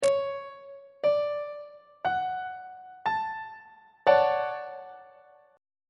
e double flat major 7th 3rd inversion on treble clef